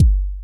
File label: heavy dubstep kick sub focused 70bpm